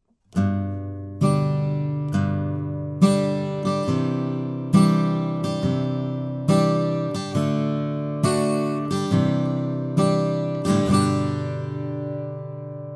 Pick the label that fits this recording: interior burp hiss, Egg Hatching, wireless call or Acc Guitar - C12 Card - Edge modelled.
Acc Guitar - C12 Card - Edge modelled